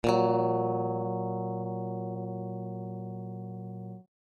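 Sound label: string A tone A sharp 2, elec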